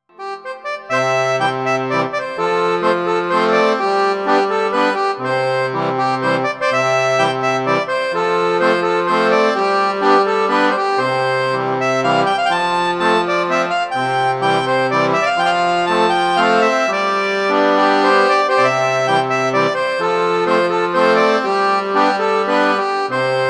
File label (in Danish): Harmonika og Chembalolyd Midi Keyboard; SbLive; DivHjemmelavetFraGamleKasettebaand